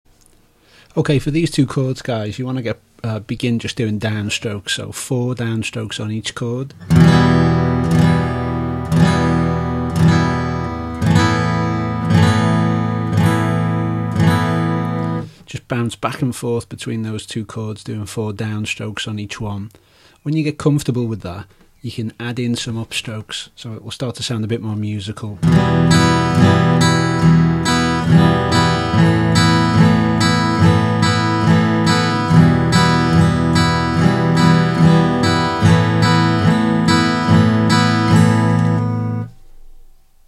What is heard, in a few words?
Em to Asus2, guitar